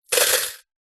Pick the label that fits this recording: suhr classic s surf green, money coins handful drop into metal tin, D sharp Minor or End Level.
money coins handful drop into metal tin